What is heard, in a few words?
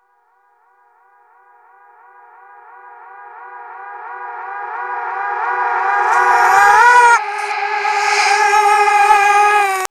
Ohh Wo Hoo reverse, vocals; AMG - Prototype Drum & Bass